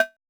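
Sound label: cowbell hi; CHZ CWBL HI; Drums In Your FACE!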